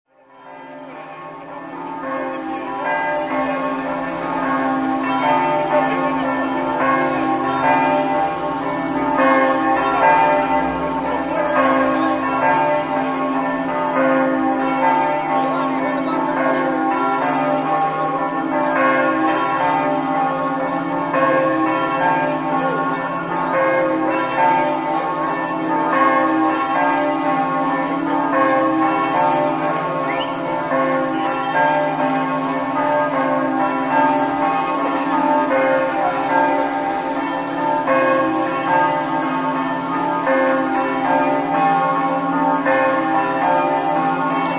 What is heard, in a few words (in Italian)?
cassacco, campane